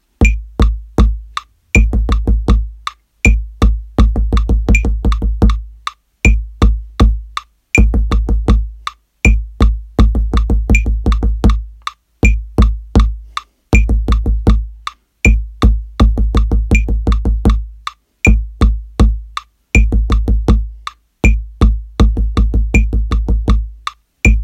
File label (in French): Rythme avec mailloche